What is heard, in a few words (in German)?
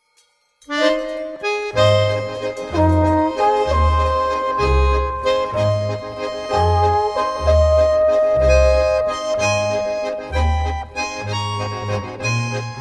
Alphorn